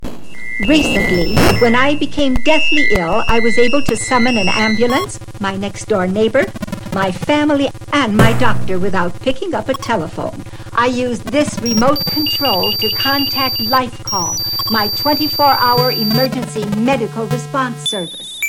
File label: sonic alert